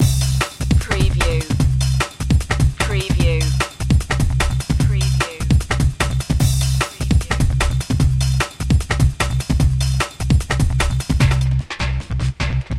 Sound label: aviation loop